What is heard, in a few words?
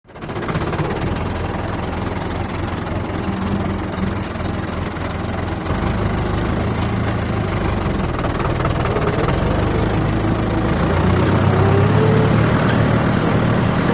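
Interior Tractor Idling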